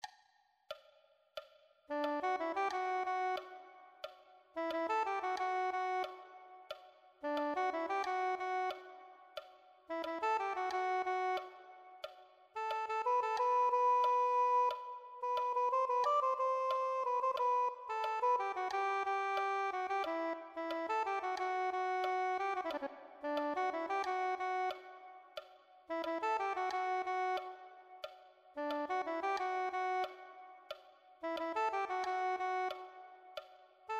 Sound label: soprano saxophone